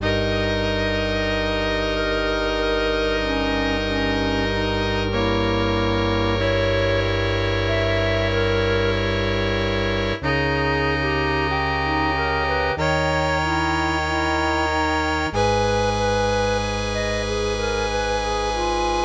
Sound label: MIDI